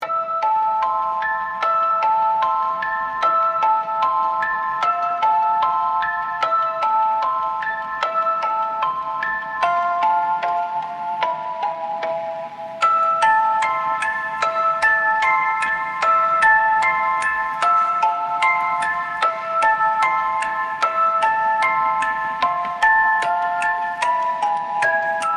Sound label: Rotary Ghost